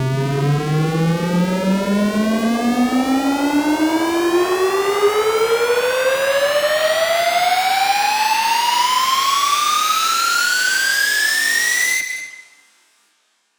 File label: Riser - 160 BPM; Cymatics - Odyssey